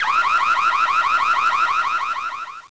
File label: car alarm; auto; Tony Hawk's Project 8; XBOX